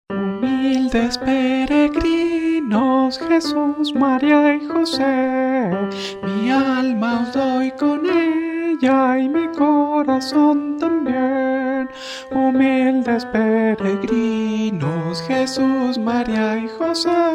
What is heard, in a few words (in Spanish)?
voz